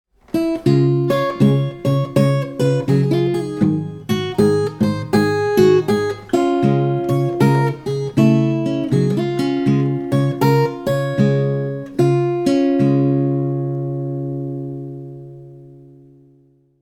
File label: Blues; Blues Book 1